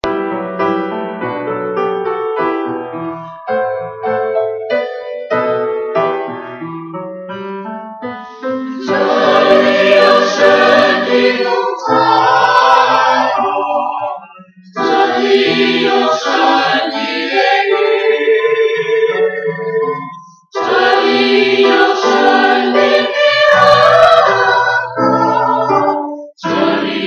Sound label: Choir